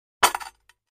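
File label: Large Tile Square Pieces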